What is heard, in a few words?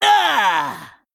Male Goblin Death